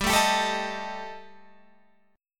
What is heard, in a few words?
G Suspended 2nd Sharp 5th G down Guitar Open F, Gsus2#5 chord; strummed; JGuitar